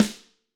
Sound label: Snare Hard; Drums; apulSoft apTrigga2; Steven Slate Drums